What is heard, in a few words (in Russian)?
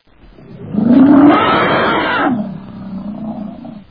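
возмущенный рев дикой кошки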